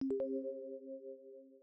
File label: Hypermaze Success Synth